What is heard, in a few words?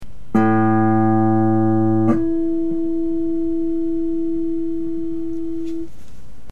guitar harmonic